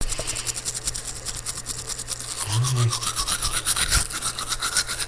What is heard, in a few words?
Brushing Teeth